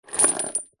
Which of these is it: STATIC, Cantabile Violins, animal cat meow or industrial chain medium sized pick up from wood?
industrial chain medium sized pick up from wood